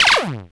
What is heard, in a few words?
laser